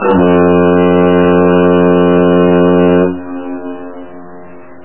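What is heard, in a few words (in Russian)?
долгий гудок парохода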